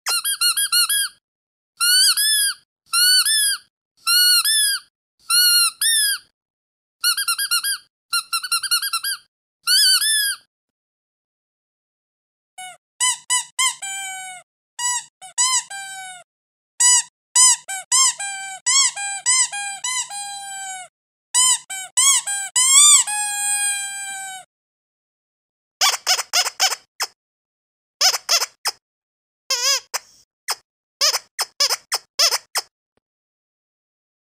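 nesk razn zvuk pishalki sobak, several different sounds of dog squeaky toys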